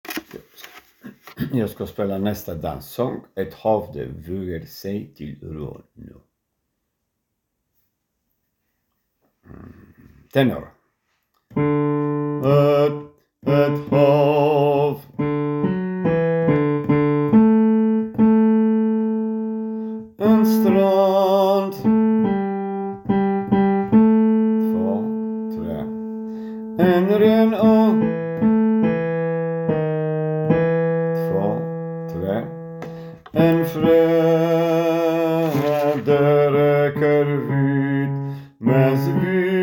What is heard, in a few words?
tenor